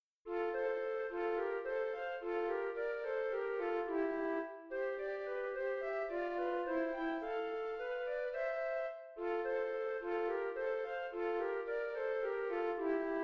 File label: easy fl duet